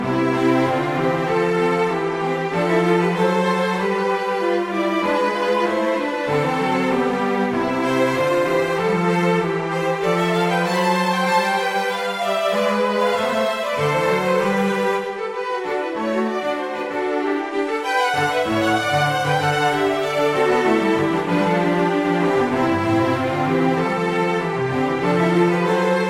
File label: Loop Classical Strings